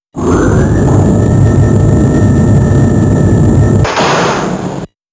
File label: SE (ELEVATOR); Capcom Belt Action Collection